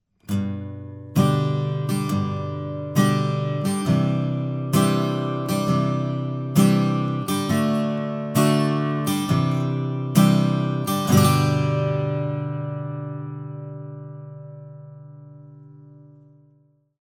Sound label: Acc Guitar - TLM103 Card - Edge modelled; cardioid (kardioida); edge duo srovnavaci test